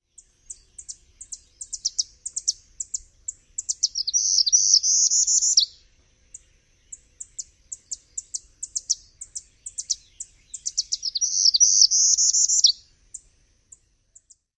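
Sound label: Northern Parula, dawn song with chips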